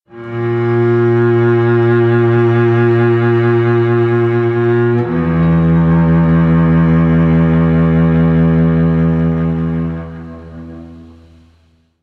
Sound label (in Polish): Kontrabas